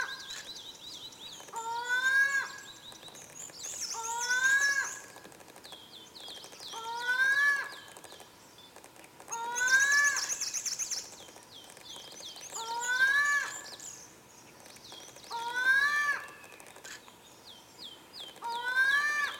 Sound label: Satyr Tragopan Call